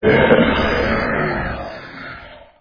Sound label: lich death